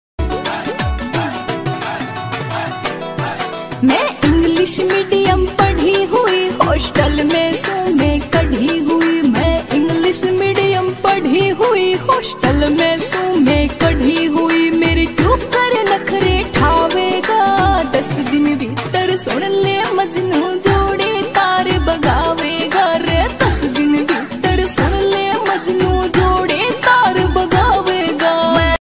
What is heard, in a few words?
instrumental bollywood ringtone